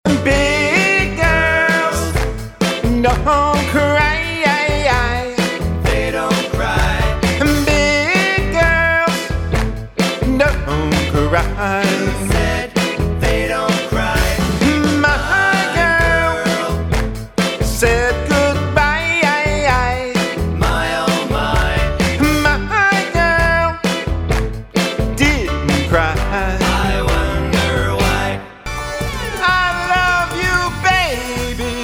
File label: Soft Rock; cabaret singer